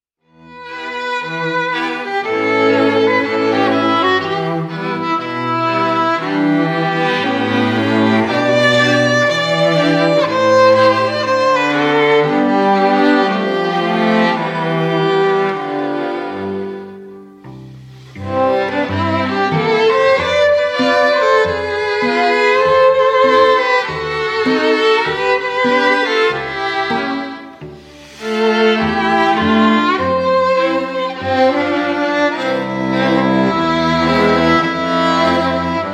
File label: strings